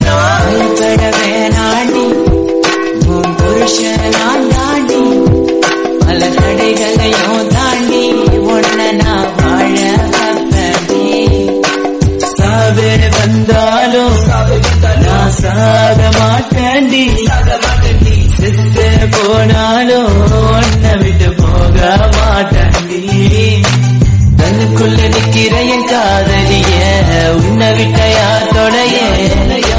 Tamil love BGM

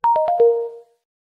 Alpha Notification; Samsung S21

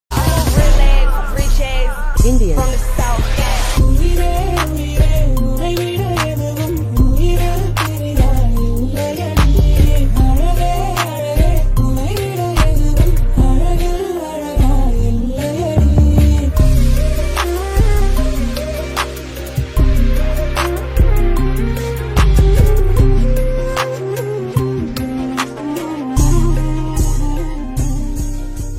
English Song Ringtone

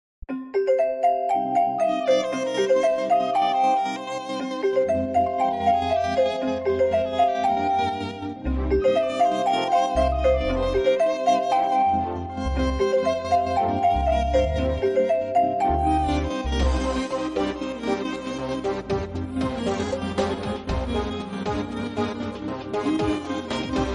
Huawei Tune Cool

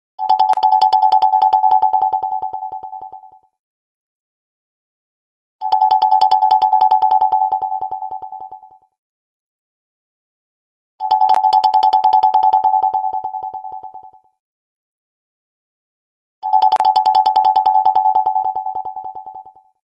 Android Ringer

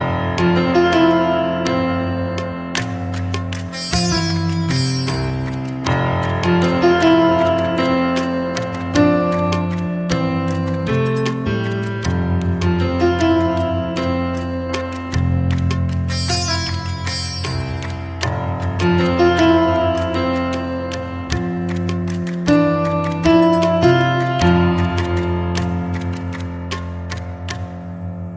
Samsung Gentle Ringtone

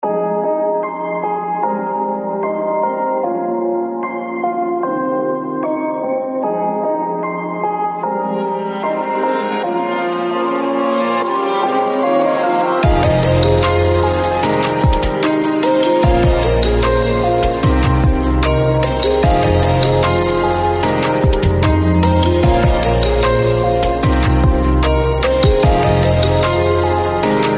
Ringtone For Huawei Nova 3i

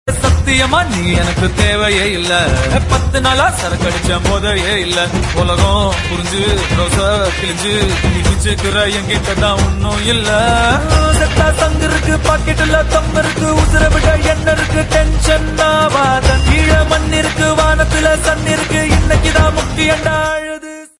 Tamil BGM

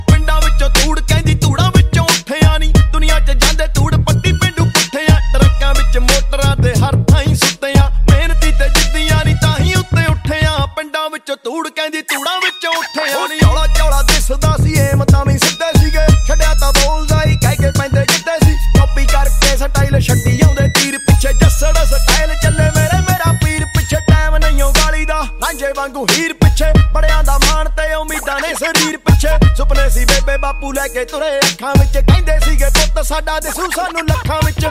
Punjabi Cool Song Ringtone

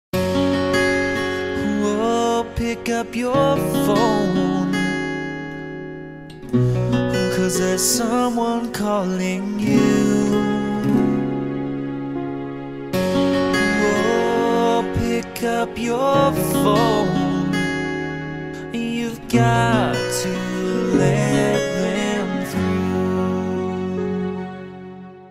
Love Ringtone

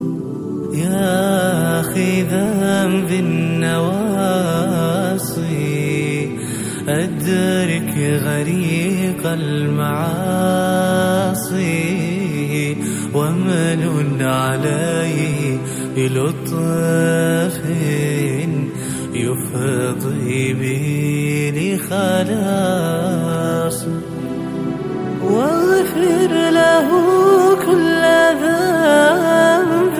Nasheed Beautiful Ringtone

